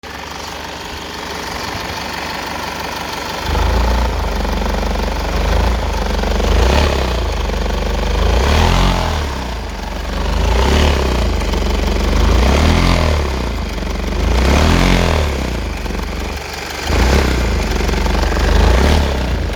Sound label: growling noise slight loss power; mazda6